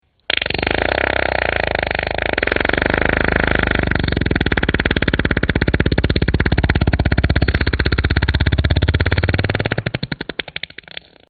pedo largo

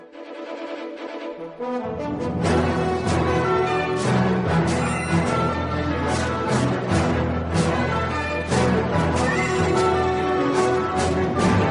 sintona; telediario tve

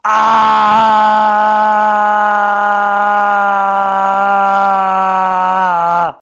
aaaaaaaa largo

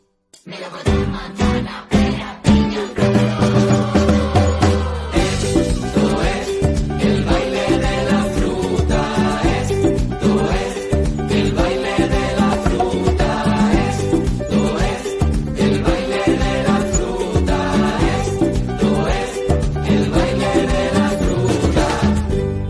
baile de la fruta

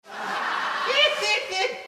risas

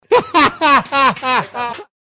risa fondo

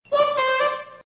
bocina graciosa

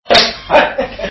jajaja

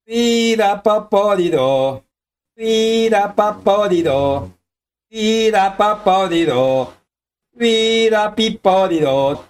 pira piporiro pira piporiro cantando

